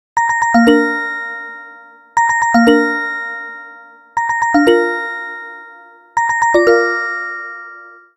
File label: Highlight, ringtones; OnePlus 12